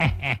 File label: laugh satan; ooh ahh